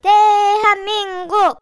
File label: CHEER